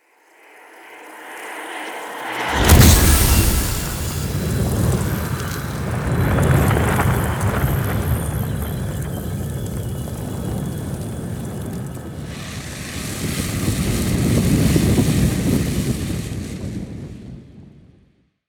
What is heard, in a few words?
Intro Eye Glow Activate; Chimera Ballas Intro; Warframe; Cinematics